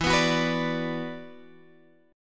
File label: E 7th Suspended 2nd, down, Guitar, Open G